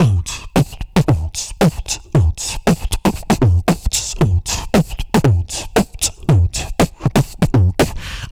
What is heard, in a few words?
VOCDRUM, MOUTH; MOUTH PC